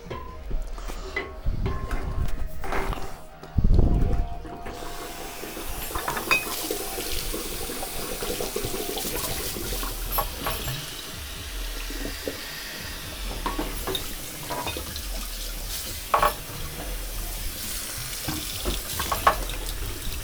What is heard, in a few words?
Clinking Bottles Cleaning